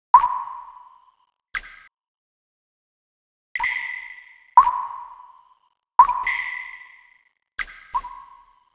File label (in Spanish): gotas